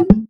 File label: warning Menu